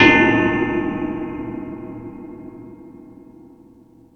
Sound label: Multi FX, Drum Machines, E-MU Pro-Cussion